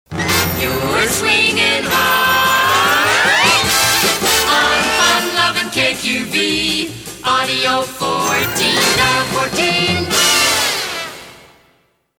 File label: jingle